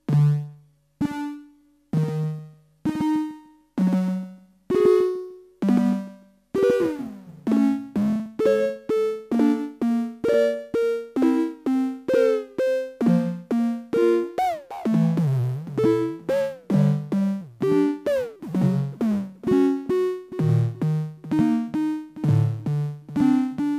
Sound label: fx vco